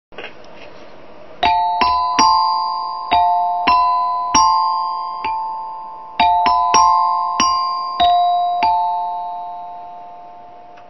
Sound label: Signal; Haiku Chimes